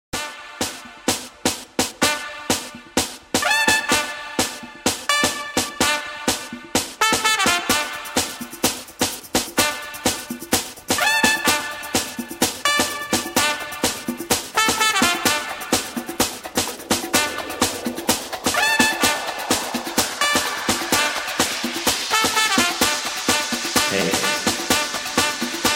trompeta

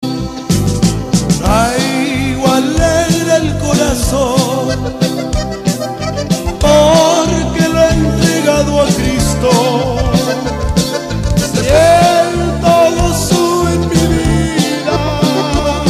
Musica christiana

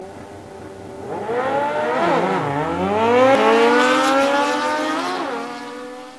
Motos

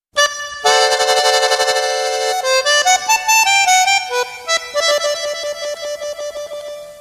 Acordeon

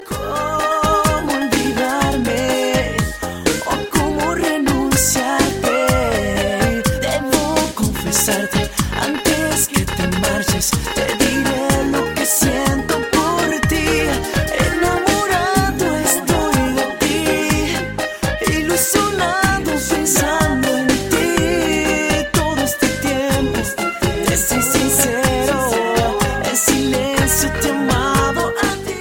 Bachata

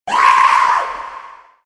Grito

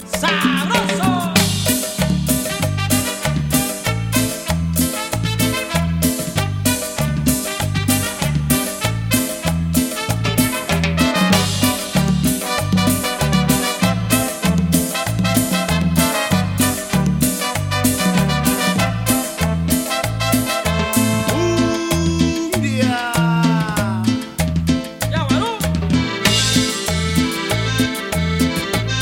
Cumbia

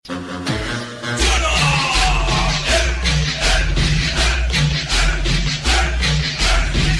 Cumbia Viejo Saxofon

